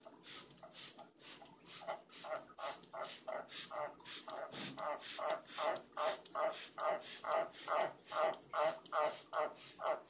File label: singing